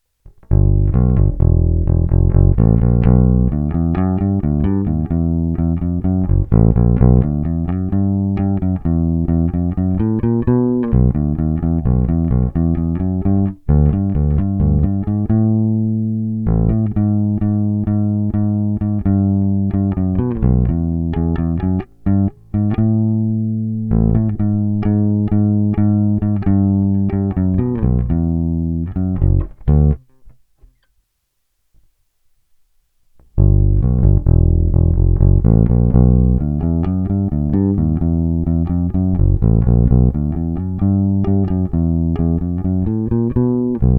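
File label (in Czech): Thomastik - DiMarzio snímač, Struny Thomastik-Infeld jazz flats; Téma: Jay Turser Precision bass JTB-400C-BK-A-U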